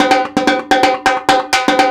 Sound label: Percussion Loops, Brazilian; Houseworx